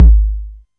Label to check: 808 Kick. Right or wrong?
Right